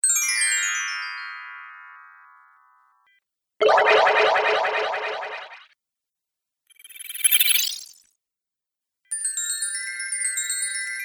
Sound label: 10 Sounds - Magic Spells; Magic Sound Effects